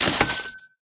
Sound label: Cat launch gift earn